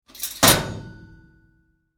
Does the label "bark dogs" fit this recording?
No